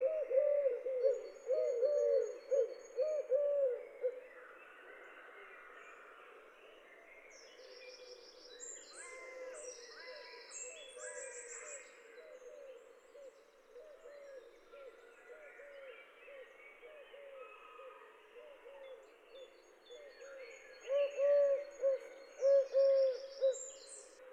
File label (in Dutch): turkse tortel; David Sluis